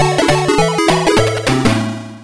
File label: Death; Smurfs the Master System